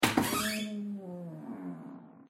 Front Door Open; hello neighbor pre-alpha alpha 1

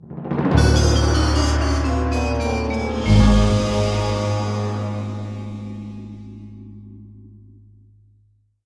Lose Jingle; Doritos Crash Course

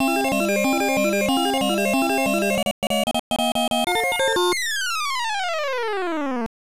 Challenging Stage Perfect

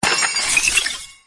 Click; the great ottomans strategy battle for throne android

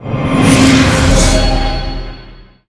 UI LEVEL UP

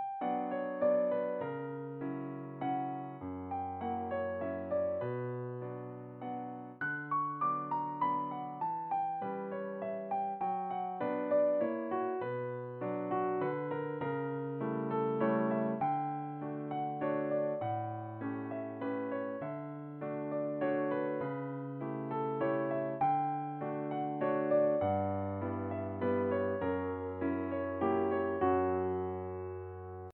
Chopin Waltz, piano; Piano app by Yokee

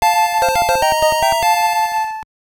Fanfare Level Up

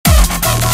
Dubstep

